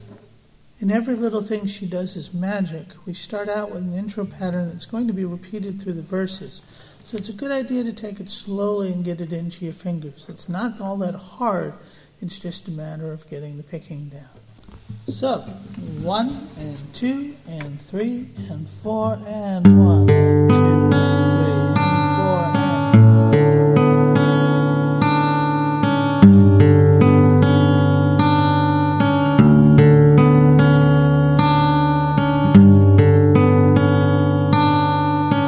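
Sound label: open tuning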